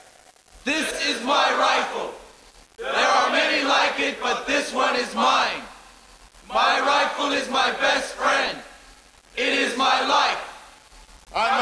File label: RIFLE